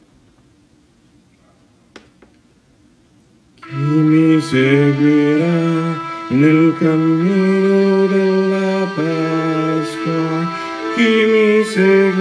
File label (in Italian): Chi Mi Seguira Contralti